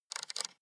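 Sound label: sports car interior volume button spin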